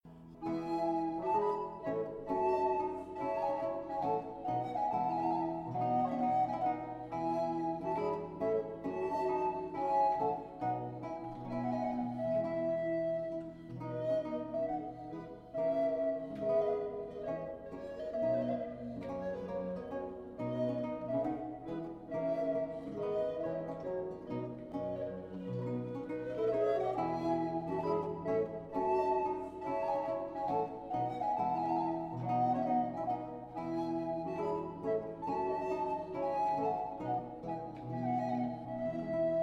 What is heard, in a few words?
menuets menuet; lully jean-baptiste